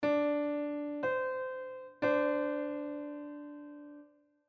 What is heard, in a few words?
981.818c 11edo